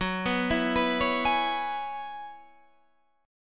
Gb 7th Suspended 2nd Suspended 4th, Guitar, Standard; 14,14,14,16,14,16; Gb7sus2sus4 arpeggiated; capo=2